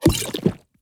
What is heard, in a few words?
potion use; skyrim